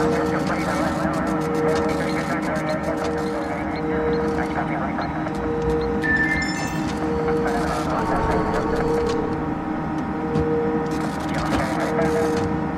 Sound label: Ambience Trade Federation Ship; angry birds star wars 2 android ios 2013